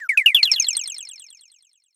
Magic Teleport, 8 and 16-bit Video Game; Impact Soundworks; Super FX

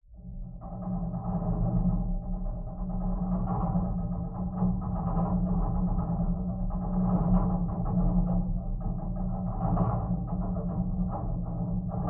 Metal - RATTLE - Shudder Constant; Geofon; Pole Position; The Stormbat Hull Interaction Library

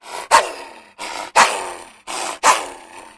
angry loop, antlion guard; hl2

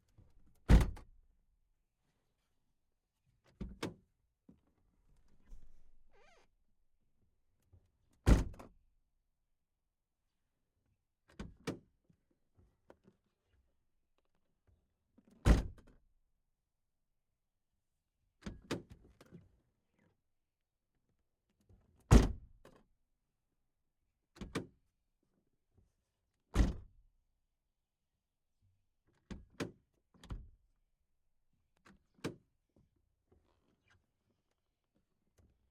Citroen C5 - Door Open and Close from the Inside; Pole Position; Citroen C5 2002; VAR SFX; MS Decoded

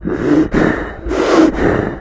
amb mad, cremator; hl2

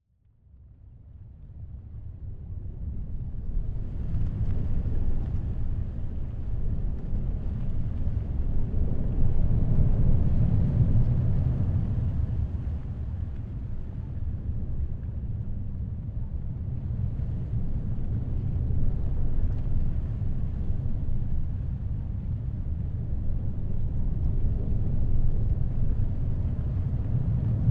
Heavy Water Churning, Deep, Ocean Drones, Wind; 344 Audio; Haunting Ambiences Vol. 2

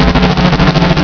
camera angry, turret; hl2; entity

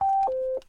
volvo v70 police system beep; Volvo V70 2006 Police Car

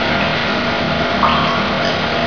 hum drip, rain; nature; hl2

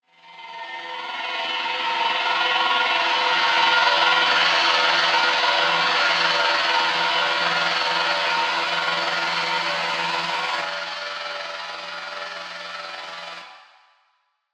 The Calling; Glitchedtones; Atmosfear

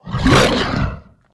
Monster Attack; David Dumais Audio; Monster Sound FX Pack 2

